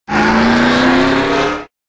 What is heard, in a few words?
accelerate; Cars; tractor tip